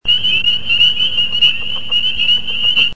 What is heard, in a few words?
spring peeper